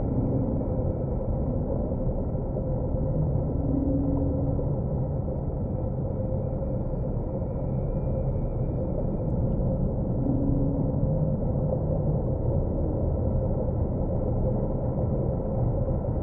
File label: ship construction ship idle; aquatics